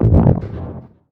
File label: Pickup Scifi Energy